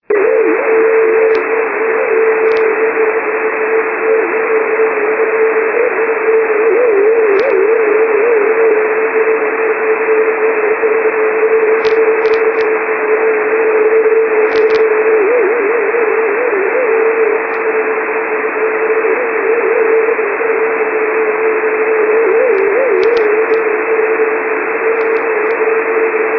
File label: warbler; 49.760; 6 Meter; N1BUG